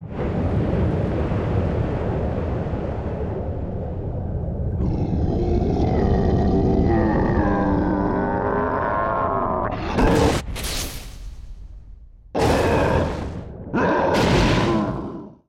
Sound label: Appear